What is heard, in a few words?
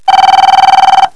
ring